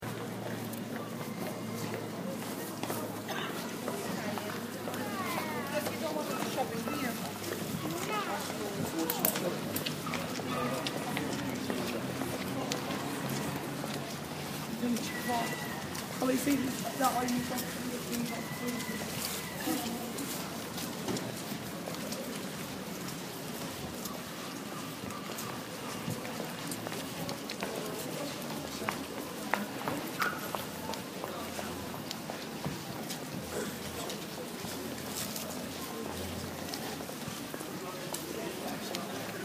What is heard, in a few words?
Electrified Environment